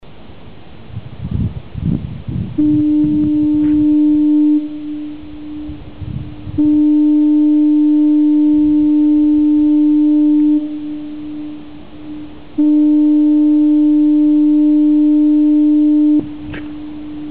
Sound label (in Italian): nautofono; pescara; i suoni del mare il nautofono